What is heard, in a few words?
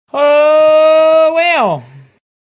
ohhhhweow